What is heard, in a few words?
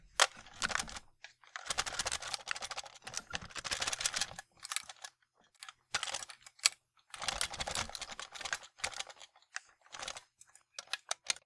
A Rifle Being Moved Around And Rattled Constantly Bright Sounding